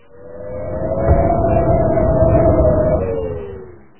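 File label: wind howl single short wailing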